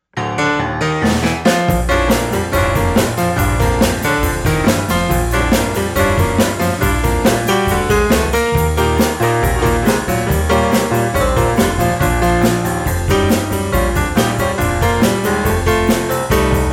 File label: New Orleans Piano